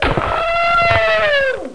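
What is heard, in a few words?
scream die; Total Chaos Nukem 3d Pack; duke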